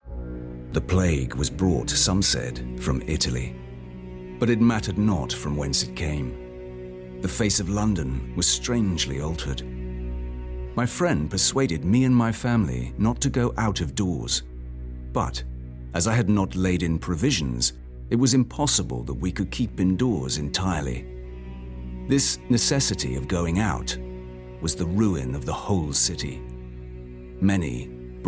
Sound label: RADIO MIX GHOSTS MUSIC